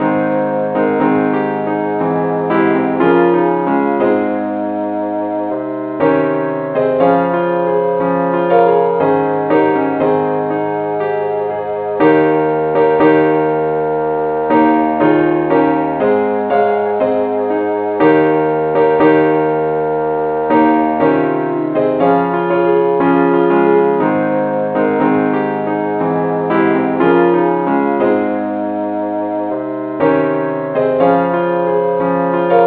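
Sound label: keyboard key D flat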